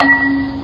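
pleasant sound of a baseball bat hitting a hard object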